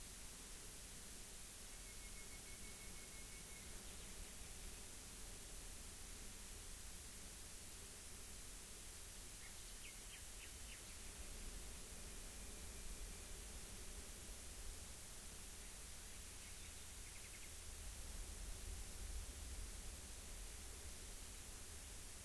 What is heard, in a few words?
rayol farm; SEAMED